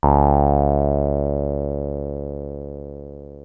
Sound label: 303 C2, TB 303